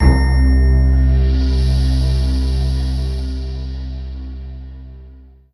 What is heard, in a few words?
Bowling; Training Start